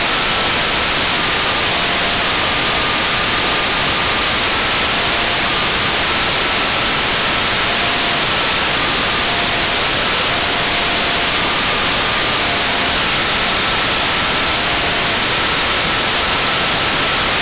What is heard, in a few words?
DFCW neg20dB SN